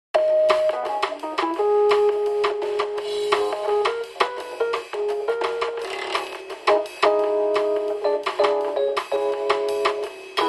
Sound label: Radio Jazz; batman arkham asylum